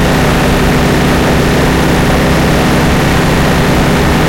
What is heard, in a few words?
tigerdiesel engine int rpm load, engines tanks; War Thunder